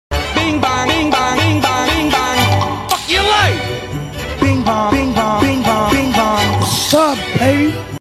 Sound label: Bing Bong Ringtone